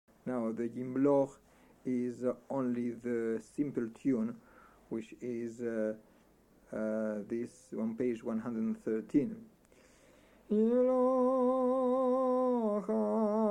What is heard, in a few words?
Yimlokh, Sephardi; Rosh Hashanah Shaharit